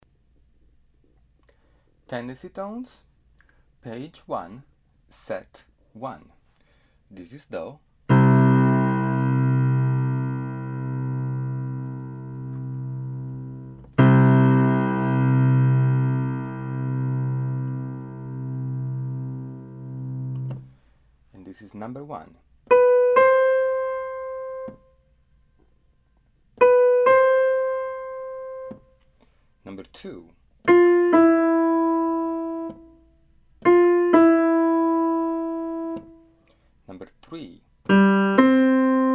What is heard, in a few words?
tendency tones